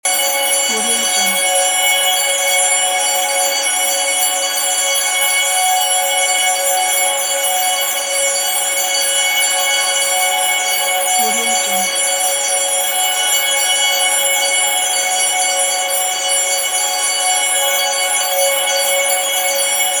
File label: magic loop